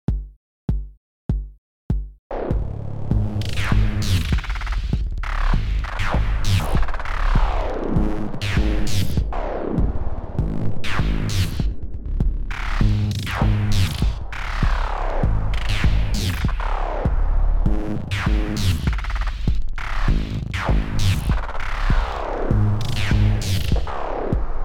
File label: pulse